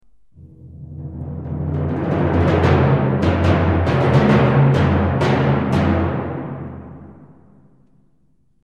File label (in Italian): timpani; pierino lupo